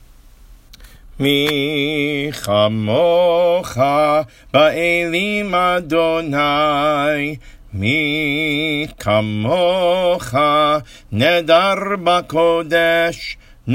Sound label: Mi Chamocha Traditional; hazzan; Seder Avodah